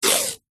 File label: foley string length run along stretched line string rub